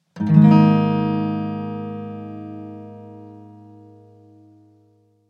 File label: sms guitar; Mudita Pure